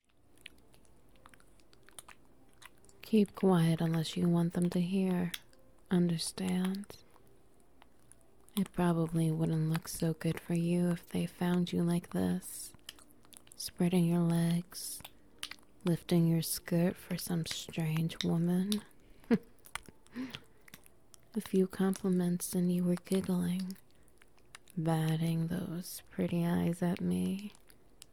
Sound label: Behind a Tavern, Ambience, Wet Noises